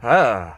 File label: disgust